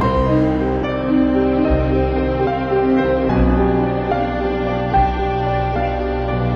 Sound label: piano, Sad